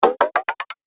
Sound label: Game Fx Ascending Hits, Video Game Sound Effects